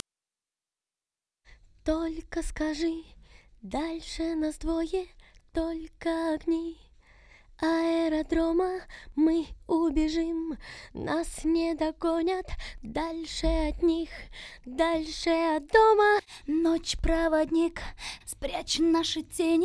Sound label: VOX Couplet, acapellas